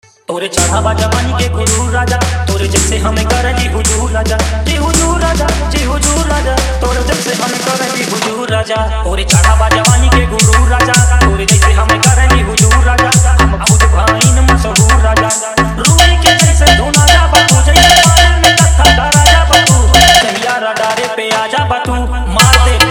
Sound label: Dj Edm Boom Bass Mix